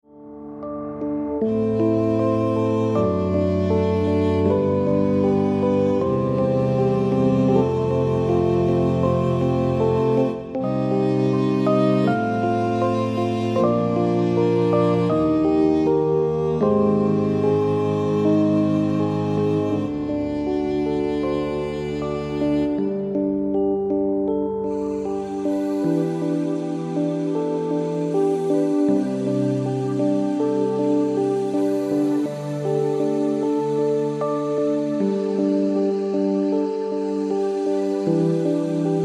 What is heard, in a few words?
Instrumental Two